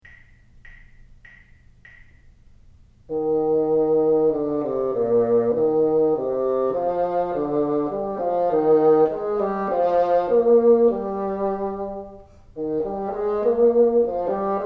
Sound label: teacher, bassoon; Repeat the vibrato exercise from Unit 48 on the following notes: G1, D2, F#3.